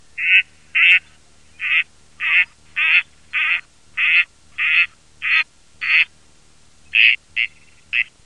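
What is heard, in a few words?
grey, ducks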